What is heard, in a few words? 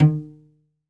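SOLO CELLO, Synclavier Strings; ILIO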